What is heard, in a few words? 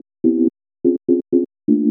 house synth 125 A, keys